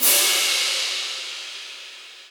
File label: Crash, Cymbal; Ableton Live 12 Lite; Core Library; Drums